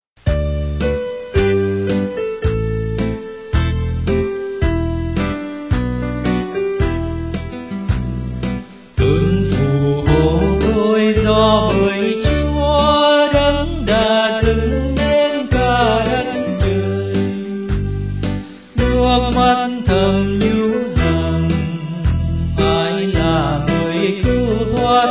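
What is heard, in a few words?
soprano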